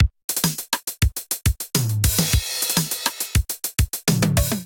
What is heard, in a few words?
707 Kit groove 103 bpm; MIDI Clips; Flight Time; Ableton; Drum Essentials